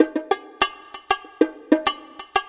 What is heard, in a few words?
bongos